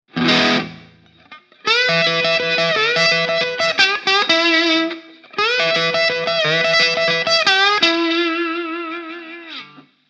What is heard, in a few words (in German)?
Bottleneck der Blueslick